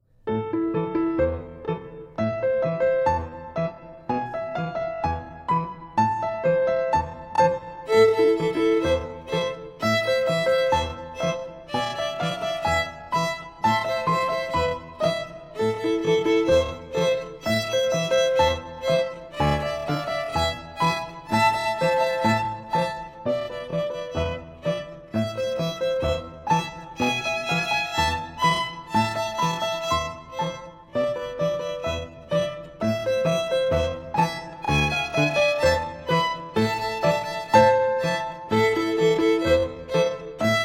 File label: Magic Carpet LENTO 120